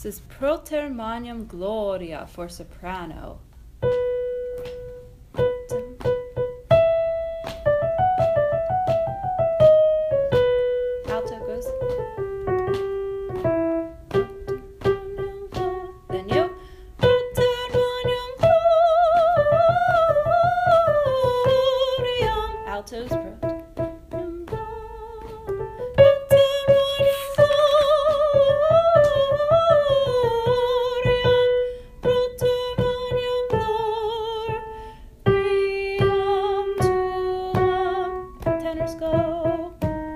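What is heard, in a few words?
propter magnum soprano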